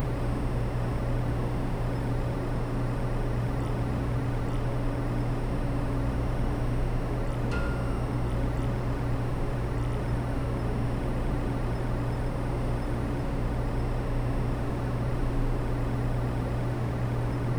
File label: office